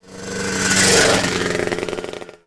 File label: PAGE CLOSE